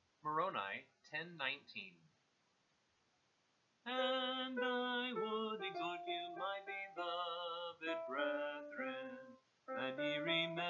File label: vocal